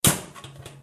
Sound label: metal locker